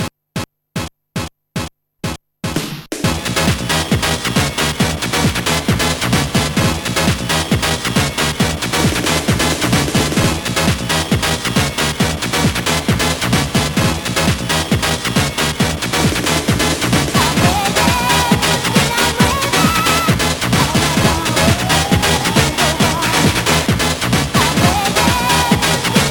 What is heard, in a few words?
sweet techno; Trackers; Modland